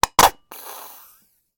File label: food mayonnaise bottle empty squirt; sound effects one